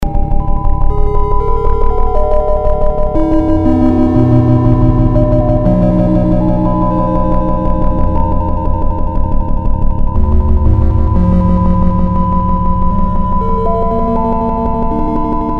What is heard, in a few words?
bleep bloop